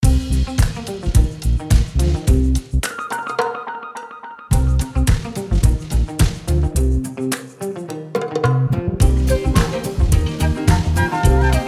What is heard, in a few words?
Funky Flute, maori music; NORD VPN